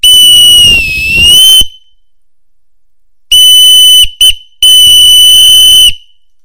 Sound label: whistle